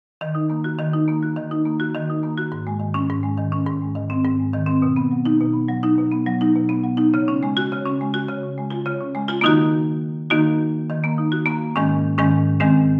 Melodic Percussion Wood Marimba Solo Singer Songwriter